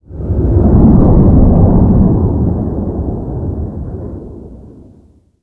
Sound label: jet flyover